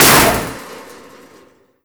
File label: wall fan metal rattle; Call of Duty Modern Warfare